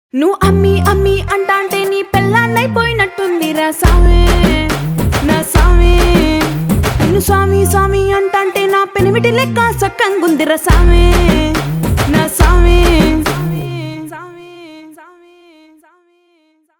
Promo BGM; Pushpa

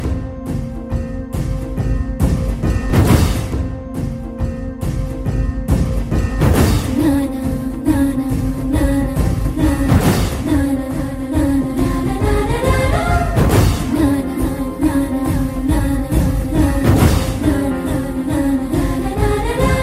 BG Humming Ringtone

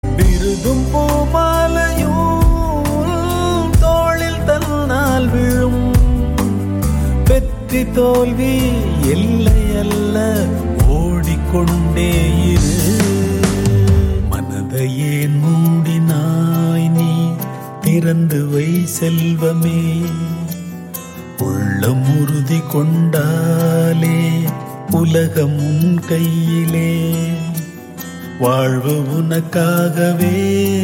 Humming BGM Ringtone

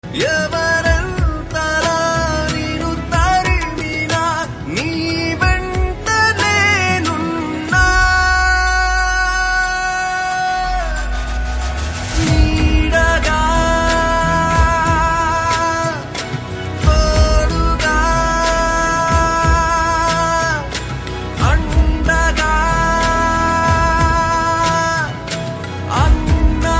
Ringtone

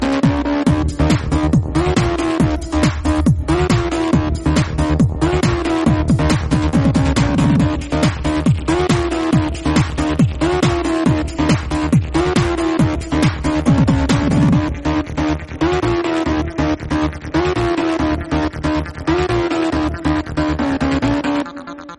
Instrumental Ringtone

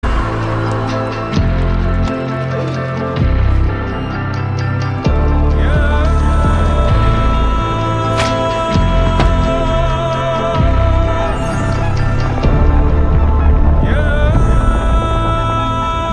Trailer BGM; Malayankunju

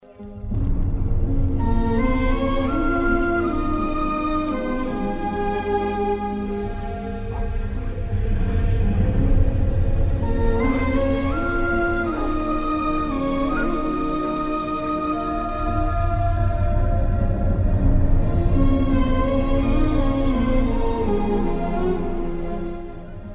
Sad BGM

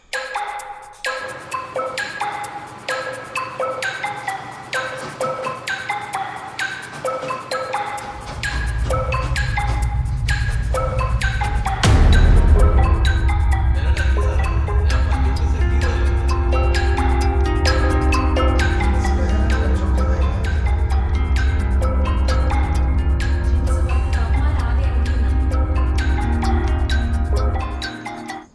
BGM

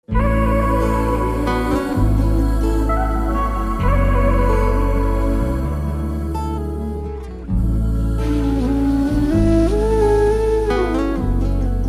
instrumental

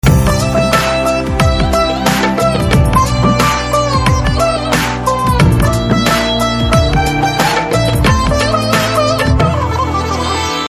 BG Violin Tone

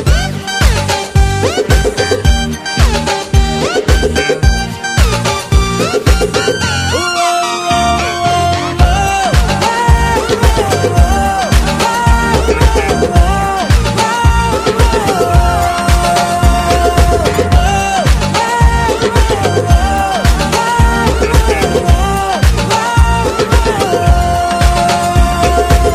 BG Beats

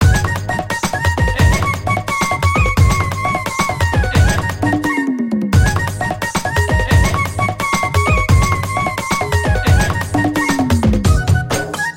Flute BGM

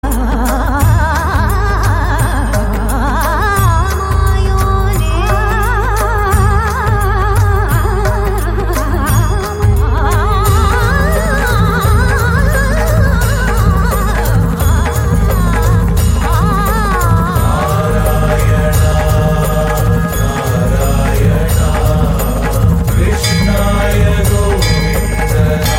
Humming Ringtone

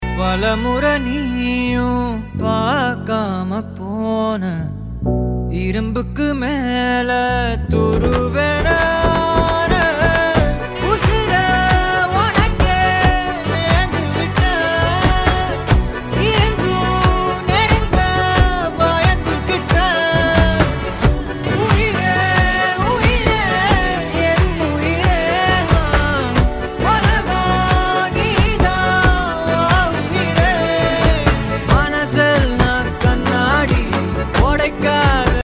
Male Version Ringtone

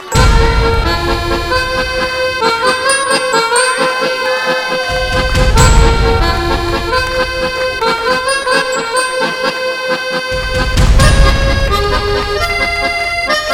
Birthday Celebration Bgm

